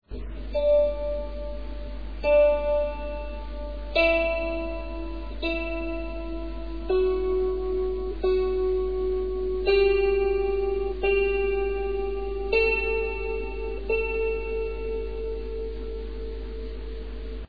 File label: tune A